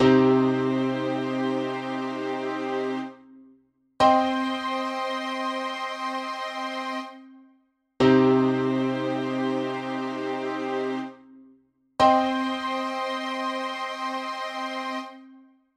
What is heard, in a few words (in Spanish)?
acorde triada menor